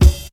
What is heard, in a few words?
silky kick sample d key